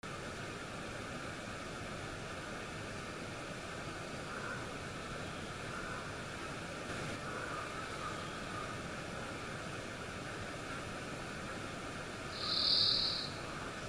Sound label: forests lonely raven